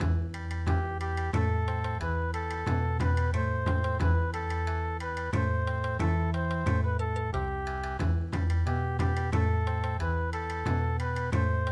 Bon Odori

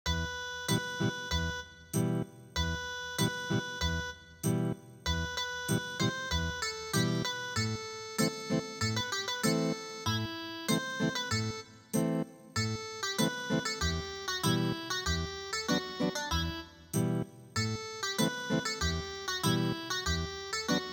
Ethnic Guitar

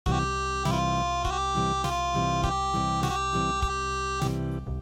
Unplugged

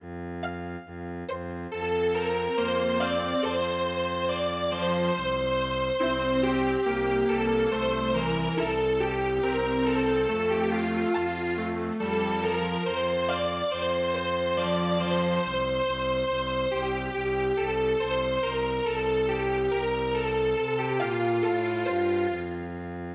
Italian Cradle Song

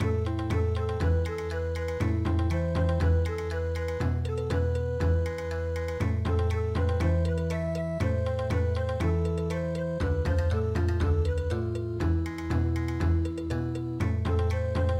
tokyo ondo bon

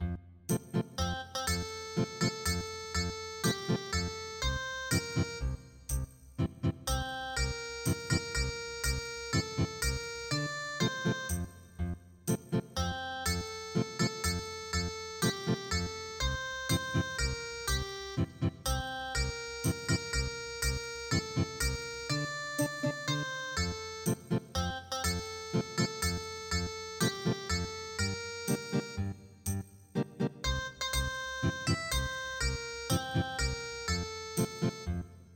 Ethnic Guitar, traditional; Heidschi Bumbeidschi

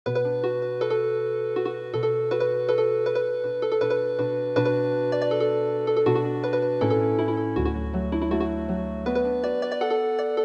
Healing Harp

